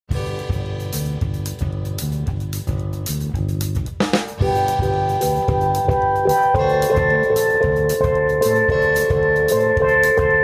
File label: Strumming Song